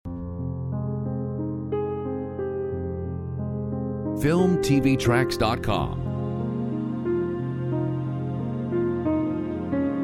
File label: Emotional Aftermath